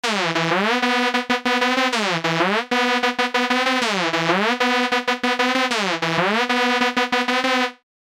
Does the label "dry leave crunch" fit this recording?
No